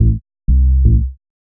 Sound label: HOUSE BASS 125 A